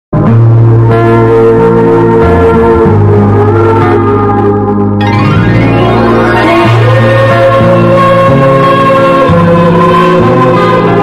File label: romantic bgm; Eeswar movie